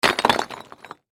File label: Impact Rock Pile; Rocks; Materials; BlastWave